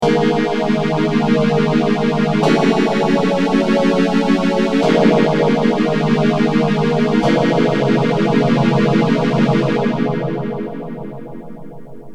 m1000 nady ps019

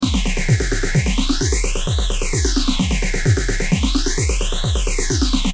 drum loop phase 100

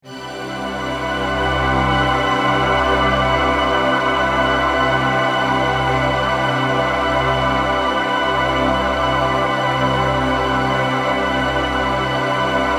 poly pad, pitch shift doubler

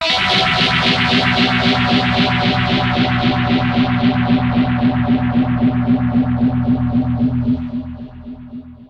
dirty gtr pigtronix

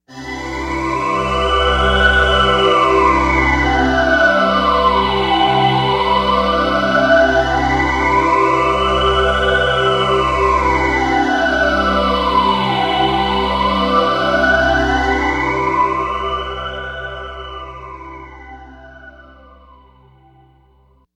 poly pad pt9pro

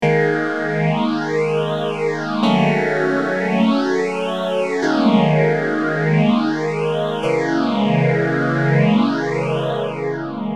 m1000 phase100

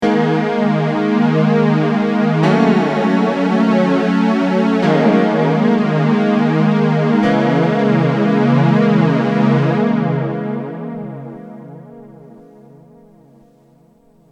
chorus

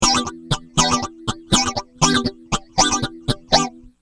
rhy gtr sph323

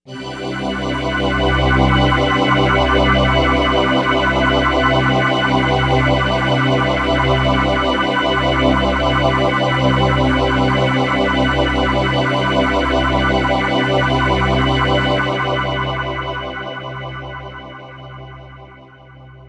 polypad stereo polyphase

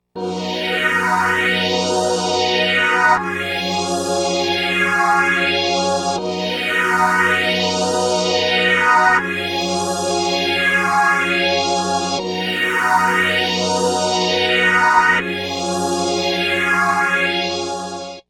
stringtron

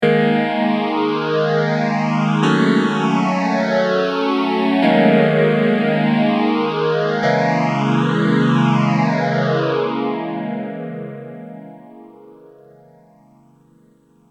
m1000 boss ph3

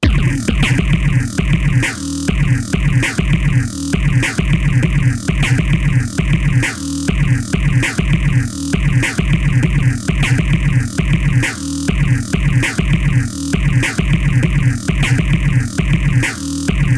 moogerphaser

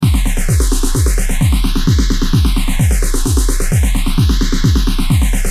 drumloop; acetone lh100

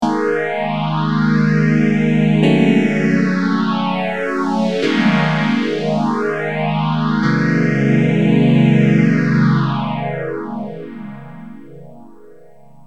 Morley deluxe phaser

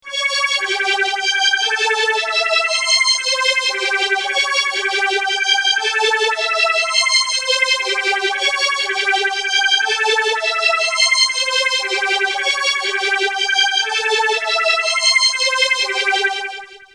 strings A intelliphase; x5dr

